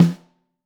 Snare Top; Ableton Foundation FreePacks; Snare Designer

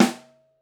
Snare Mono

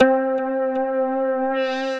Pathos Pad, Synth Pad; Pathos Pad Portree

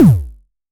modular attacked, Kickbank; mpk mini presets; Ableton Foundation FreePacks

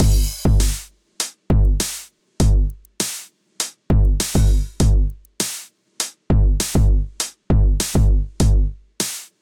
909 100 Beat, Drum Machines; Myrtle; Ableton

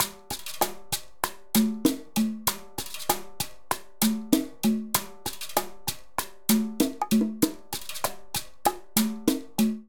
Bolero-86bpm, Latin Percussion; Ableton; Factory Packs

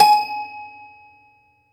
hard G#5, Glitch Toys & LoFi; mpk mini presets; Ableton Foundation FreePacks

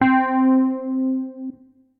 Wurly, Electric Keyboards; Wurly Piano; Wurly Logical Tramp; Ableton; Factory Packs

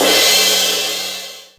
Crash; Crash Trax; Ableton; Factory Packs; Drum Hits; Cymbal

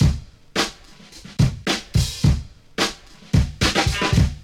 Drum Break Hip Hop; Ableton; Breakbeats by KutMasta Kurt

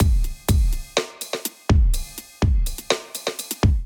Kit groove 124 bpm, Drum; MIDI Clips; Sense 7 Kit groove; Ableton; Factory Packs; Drum Essentials

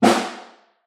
Far, Snare

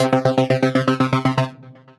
PW sync arp, synth rhythmic; Ableton; Factory Packs; Synth Essentials

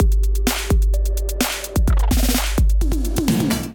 Kit groove 128 bpm; MIDI Clips; Main Frame Kit groove; Ableton; Factory Packs; Drum Essentials

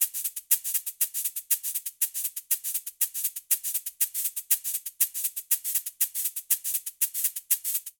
Samba 1 Cabasa 120bpm; Latin Percussion; Ableton; Factory Packs

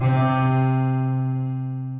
Piano, Synth Keys; Instruments; Piano Coal Mine; Ableton; Factory Packs; Synth Essentials; Collision

